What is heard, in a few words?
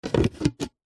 household swiming pool skimmer box plastic disc lid place on; zapsplat